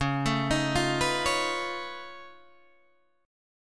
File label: Db Augmented 9th Db down Guitar Open D